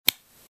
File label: click short